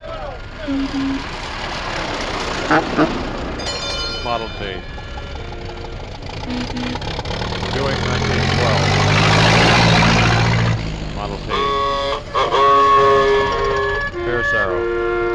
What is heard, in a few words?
Automobile; reel 2; tony schwartz